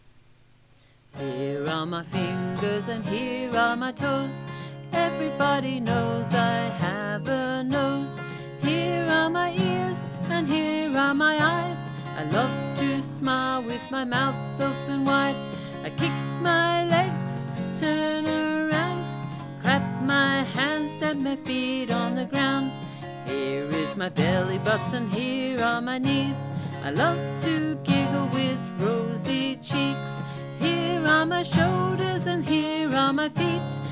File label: Toddler Workout Body Parts